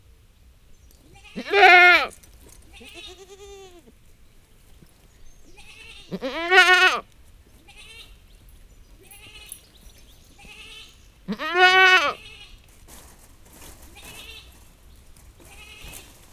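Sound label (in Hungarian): kecske mekeg nek láncscsörgés; parasztudvar; hazikecske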